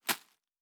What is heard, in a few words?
Leaf Run Surface, Footsteps